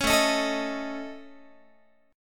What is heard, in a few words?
A Major Flat 5th A Guitar, chord; AMb5; strummed; 16,17,18,19,19,x